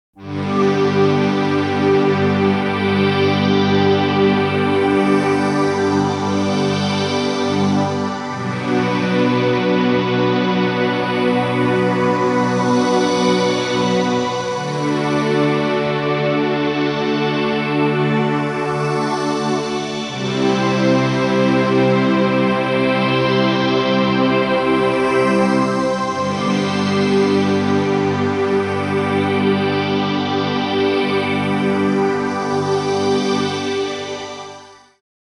Synth Pad

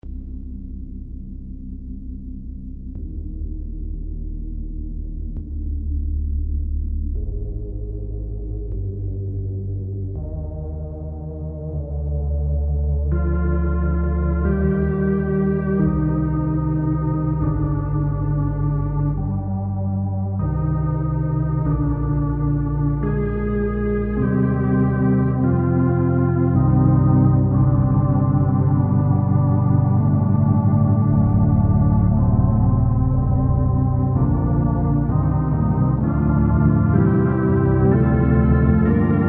3 Noises

